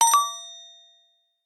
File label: bellhop, notifications; Google; Google Sounds; Reality Bytes